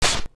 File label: Scratch; rpg